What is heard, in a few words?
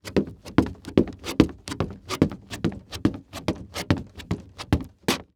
Foley Footstep Golf Cleats Jog On Wood; Foley Footsteps